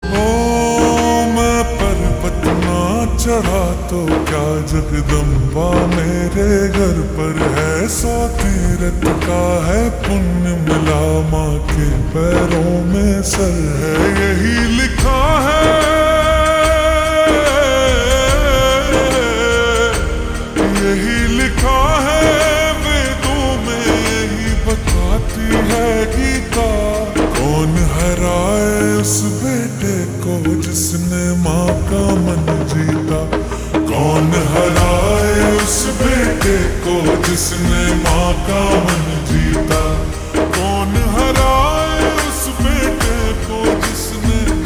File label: Ringtone slowed and reverb